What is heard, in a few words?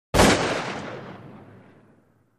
12 Gauge Shotgun; Blast With Ring Off; shots